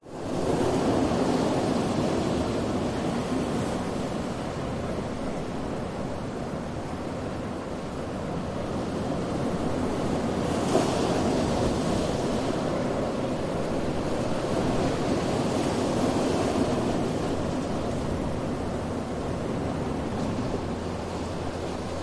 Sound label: nature ocean waves medium break shore rocks slight distance; zapsplat